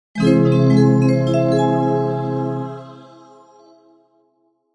Mystery; An NPC's Odyssey

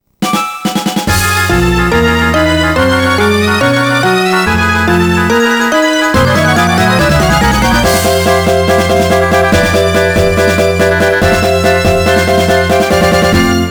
Travel Song ~ Transformation Version; Irozuki Tingle no Koi no Balloon Trip Special Sound Track; Great Transformation at Image Street

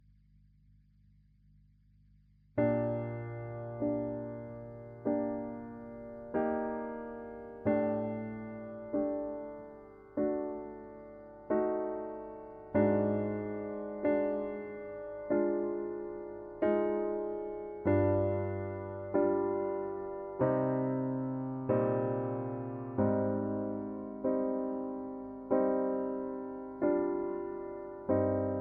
Synths & Keys

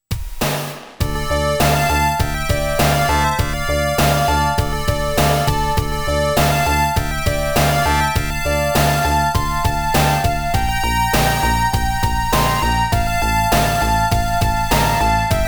YM2151; Cho Jin; X68000

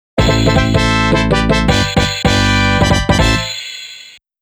Item Get Double Triple; arcade disc in jaleco variety

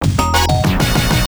Player Victory Dance; escape kids arcade gamerip 1991

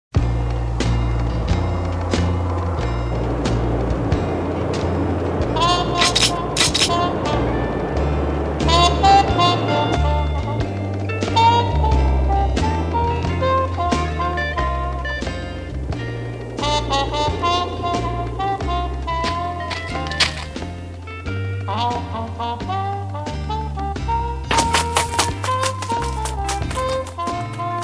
GALLOP SAMBA; Lupin III '71 ME Tracks

